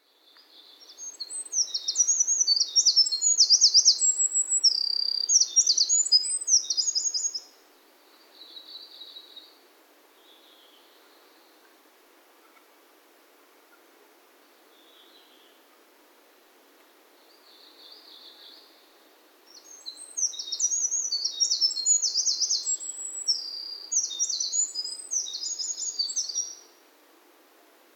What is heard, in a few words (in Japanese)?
ミソサザイ さえずり; 日光白根山